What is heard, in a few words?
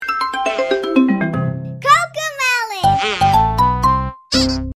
haha; cocomelon